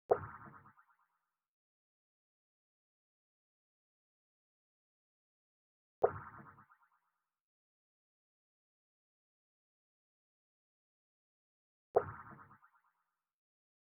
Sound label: 81 BPM A# Min Rimshot; Cymatics; Destiny Gold Expansion; Orchid